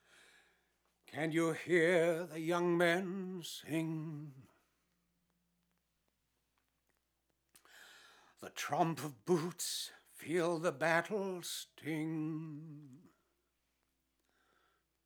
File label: So Many Vocals